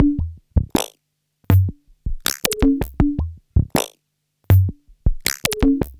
Modular Loop, Modular Percussion

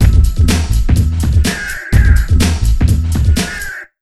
BIG 125, Big Beat Drumloops; USB Soundscan vol.25; AKAI

